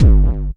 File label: KICK hardcore, Techno Trance DRUMS; Techno Trance Essentials